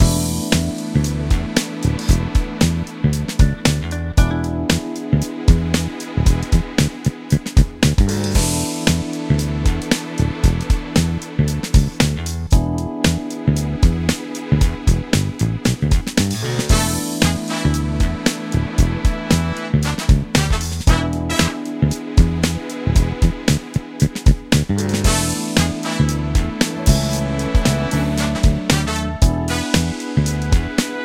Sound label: main music; twin spin